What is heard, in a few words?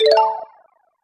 Scene Change; UI